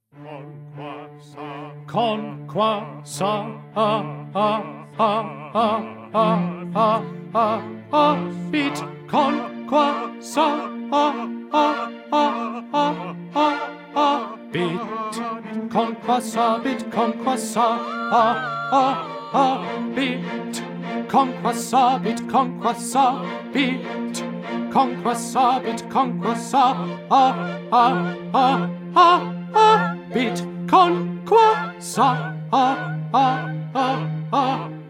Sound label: Tenor; Handel; Dixit Dominus